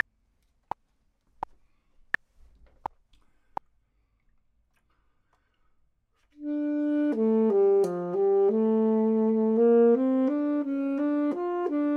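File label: bottom, Saxophone and Clarinet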